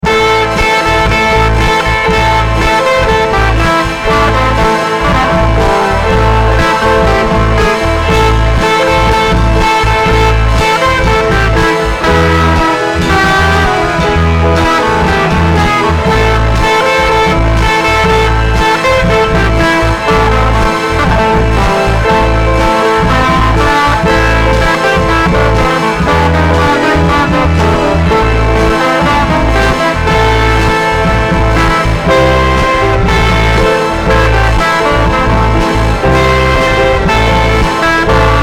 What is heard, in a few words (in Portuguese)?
orquestra grupo instrumental; gitlitz paul